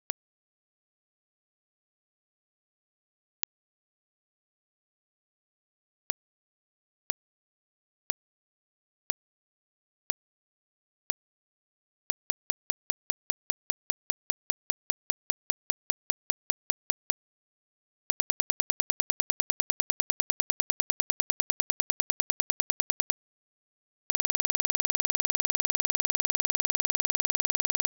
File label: pulse